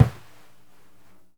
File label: deff slap; Deff Bendir &Req